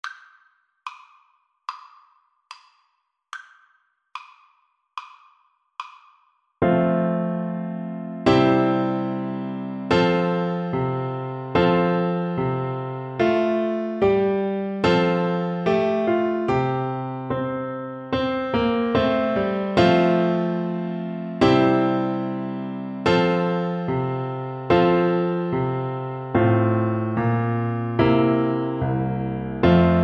trumpet voluntary, oboe

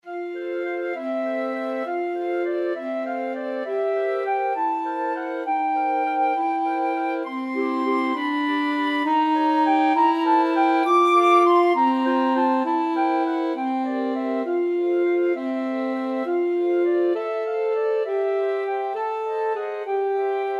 flexible mixed ensemble, 4 players

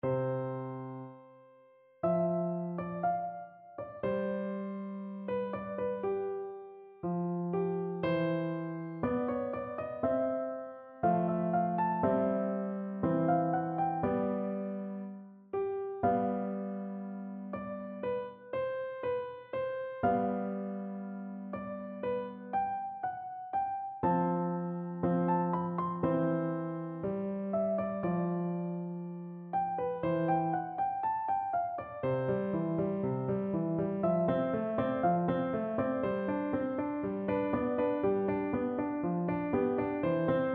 Romance, piano

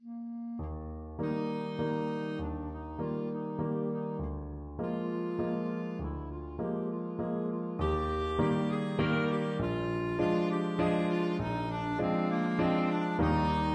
clarinet; beethoven german dance

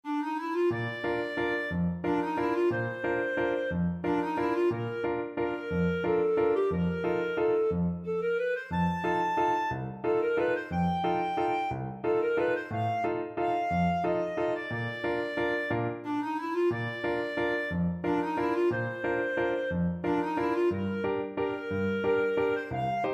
wedding dance, clarinet; lincke

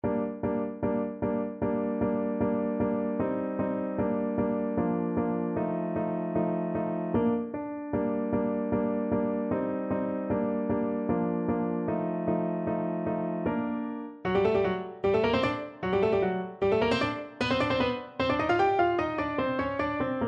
arabesque, Clarinet; Burgmuller, Friedrich - Arabesque from 25 Progressive Pieces, Op.100

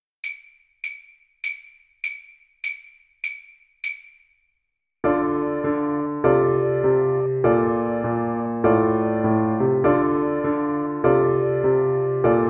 blues Flute

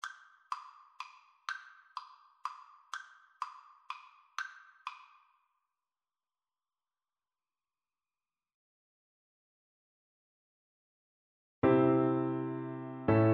blow the man down, Flute

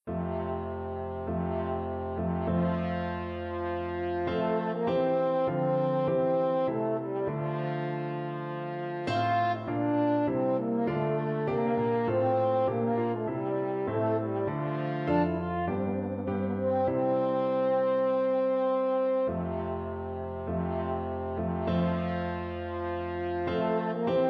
March D, french horn; Handel

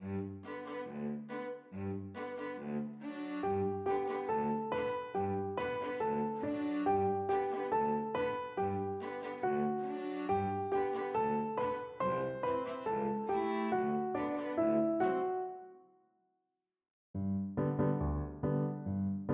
piano quartet; yankeedoodle

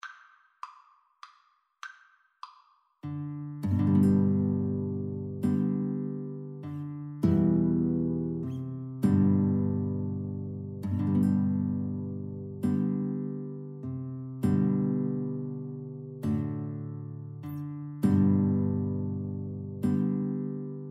amazing grace, Guitar-Flute Duet

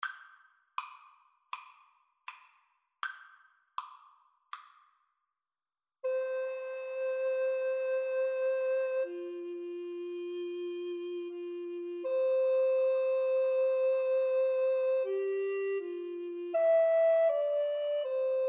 2AREC, Alto Recorder Duet

